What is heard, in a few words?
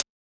DnB Hat; drumnbass 170bpm; Drum Kits; musicradar; Drum Hits A